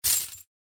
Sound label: get coin